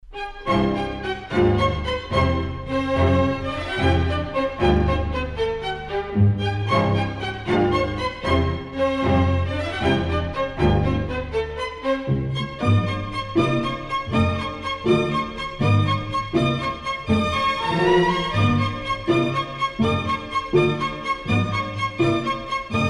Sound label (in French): Concertino pour harpe et orchestre; DAMASE